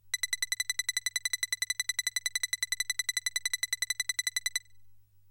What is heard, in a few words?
CPU Typing; MMX